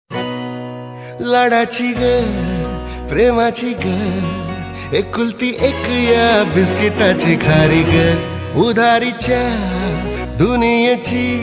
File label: Marathi Ringtone